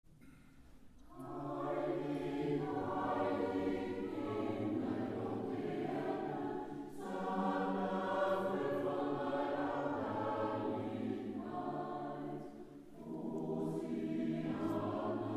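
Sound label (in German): Heilig Alpenländische Messe, Chor; Chor Cantate nova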